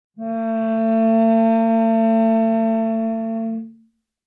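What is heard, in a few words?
Cello arco fortissimo sul C A3; MIS Pitches - 2014; Strings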